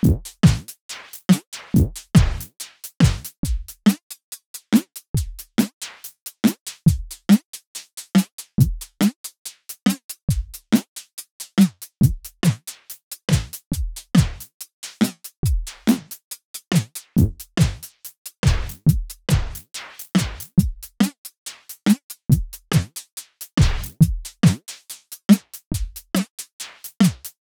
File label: 140 slime beat; daily samples